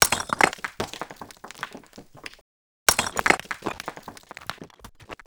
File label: Resynth Stone Break